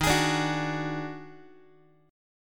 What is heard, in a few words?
D sharp Minor Major 7th Double Flat 5th D sharp, down, Guitar; strummed; chord; x,11,11,12,10,x